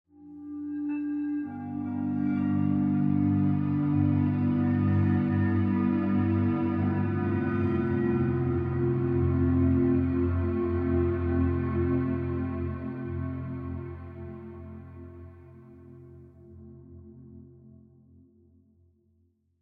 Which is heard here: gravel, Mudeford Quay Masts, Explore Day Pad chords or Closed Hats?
Explore Day Pad chords